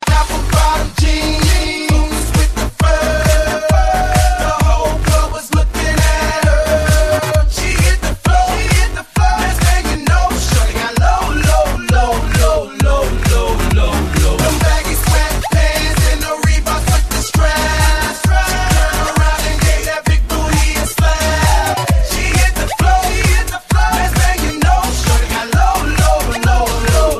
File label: electro mix